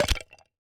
skeleton hurt

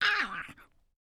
dark mage hurt

